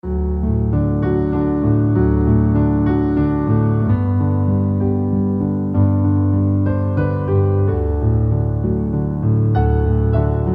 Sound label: piano